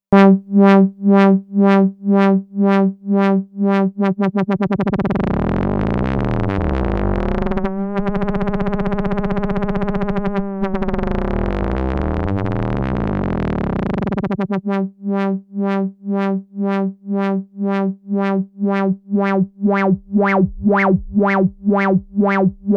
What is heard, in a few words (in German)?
FM ohne und mit Resonanz